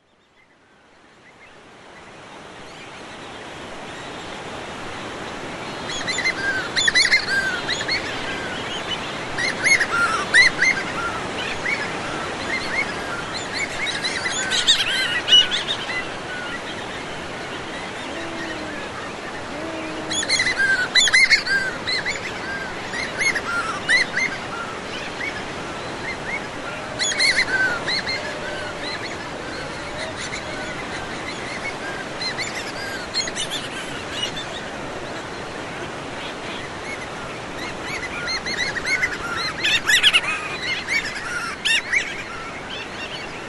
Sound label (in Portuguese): Frulho, Graciosa Acores